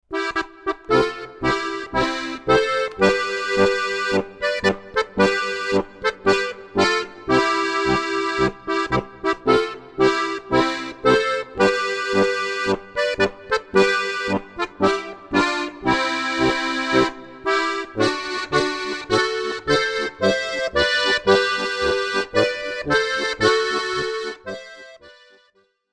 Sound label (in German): Marsch